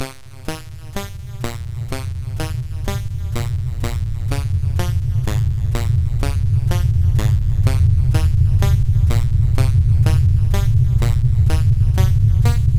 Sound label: Level Music, sid CSG8580R5